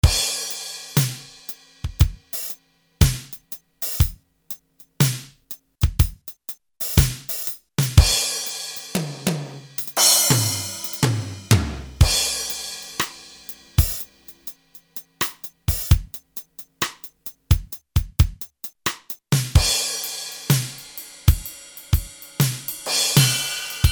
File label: KIT LA Fat Kit; Roland L-CDX-01 Rhythm Section Instruments